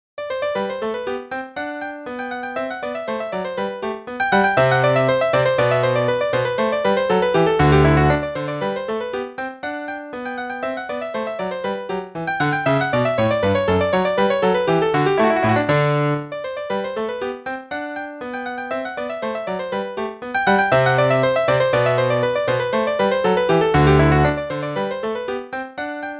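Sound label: Play-along, Air, quarter note=120; Handel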